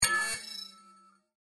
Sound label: combine tech cam